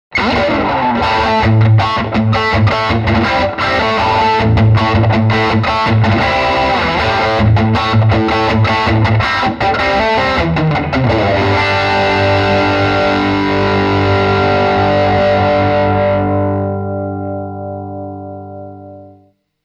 Crunch Heavy US

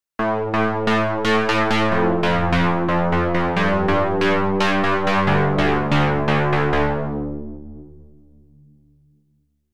Drama Keys; Curve 2

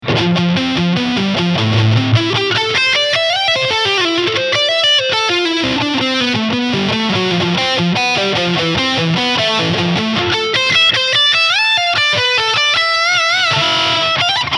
Boss Katana 50 MKII Preset Lead Reverb

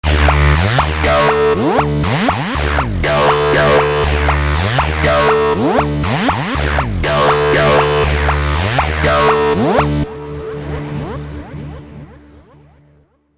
Arpeggiator

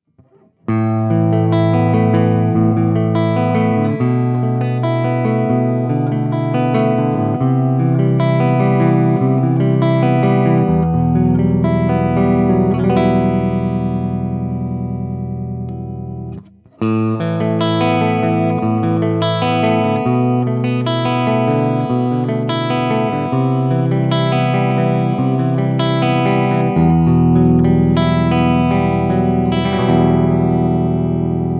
Clean Picking

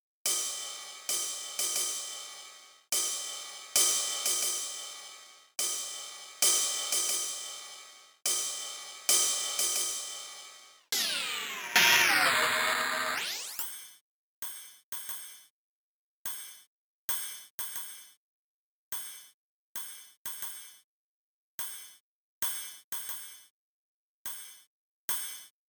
RIDE 909 Accent

